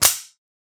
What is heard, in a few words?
gun loading; soundscrate